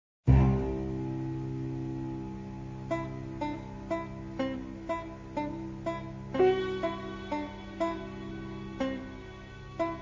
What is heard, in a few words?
Grotesque Suspense